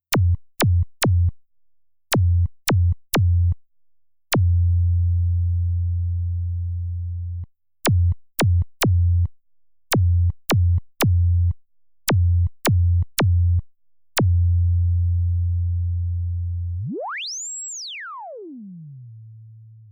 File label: Pulse 2 Preset Zap